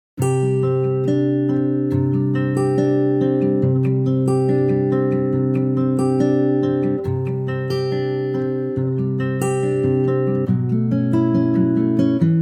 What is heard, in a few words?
C Acoustic Guitar; key - C - vocal range - A to C; Stunning acoustic guitar arrangement